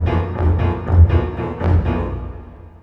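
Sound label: fairground 116; Orchestral Loops; Best Service ProSamples vol.33; AKAI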